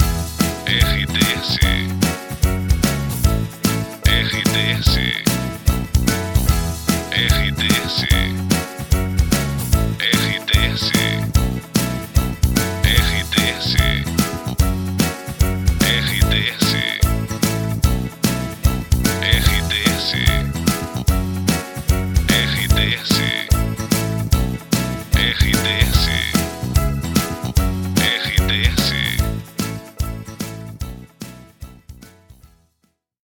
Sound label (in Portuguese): TRILHA PARA LOCUCAO POPULAR